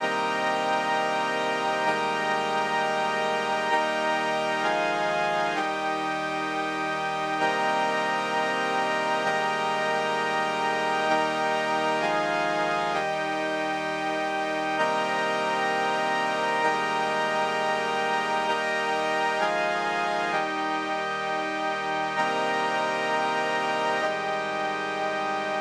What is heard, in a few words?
mono pad wide graphic eq